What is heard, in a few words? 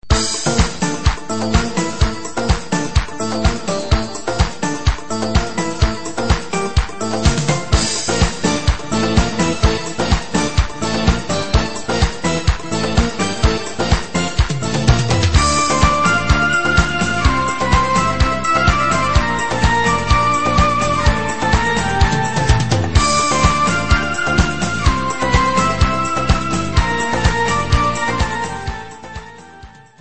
Instrumental